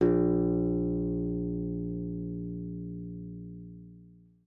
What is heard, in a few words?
A#1, guitar; Xsample VOL-2